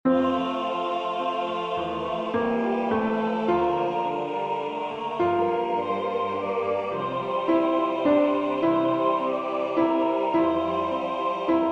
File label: Laudate Dominum Alto, rehearsal tracks